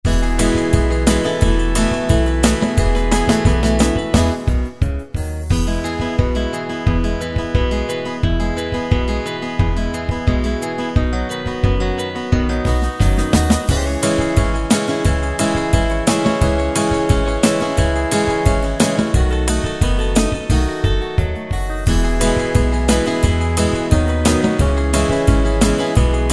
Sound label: Folk Rock; Preset Styles